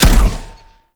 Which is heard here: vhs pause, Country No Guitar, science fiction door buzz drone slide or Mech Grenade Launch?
Mech Grenade Launch